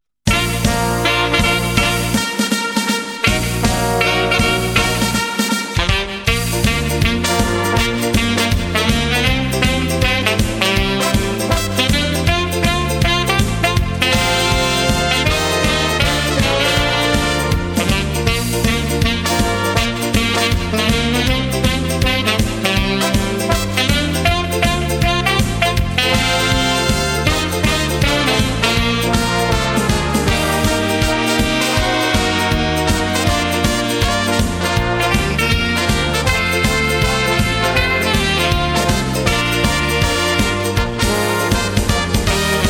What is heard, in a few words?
Swing Boogie